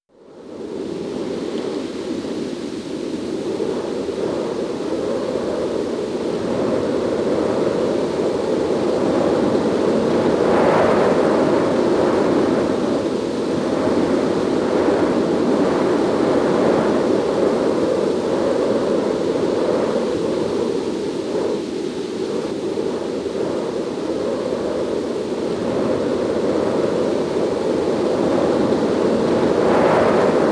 Burnt Remains Stage (AMBIENT); King of Fighters XIII; PS3 version

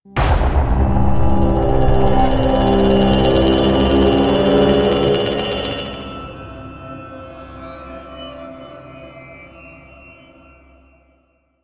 Mission Music Creep Stinger; starcraft 2 wings of liberty

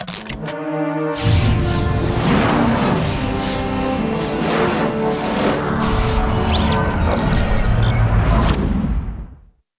Restoration Sequence Fanfare; Metroid Other M

